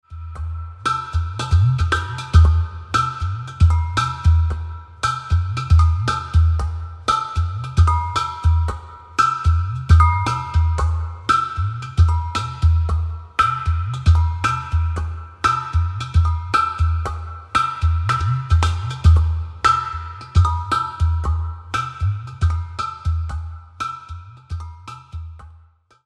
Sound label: udu